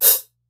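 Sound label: open hi hat ANALOG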